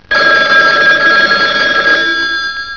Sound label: ring; Phone ringing